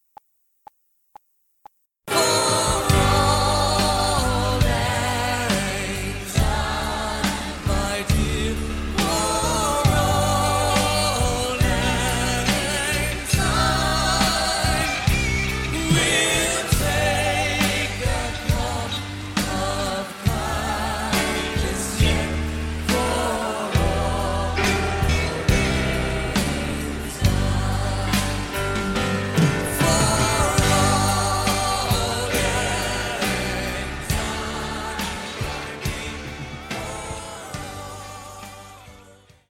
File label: G Rock; Christmas; Auld Lang Syne